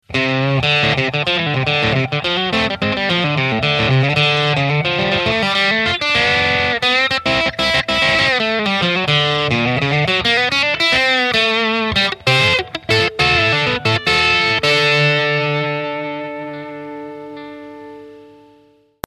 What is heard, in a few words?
Soft Drive Half Fulldrive